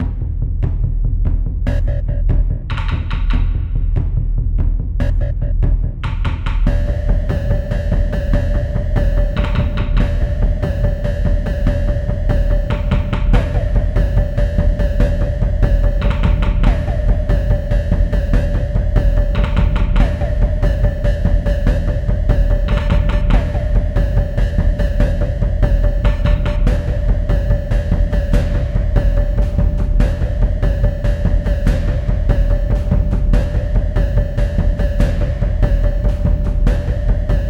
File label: Intense